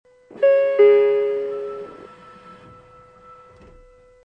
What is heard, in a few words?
subway door; ドアー開閉音